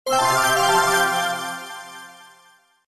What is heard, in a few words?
level up